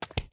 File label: Link Ledge Grab; The Wind Waker; Link jumping and grabbing onto a ledge